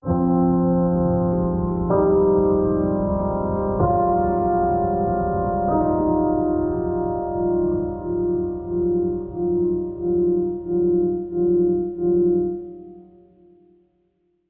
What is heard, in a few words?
Pianoscape Sting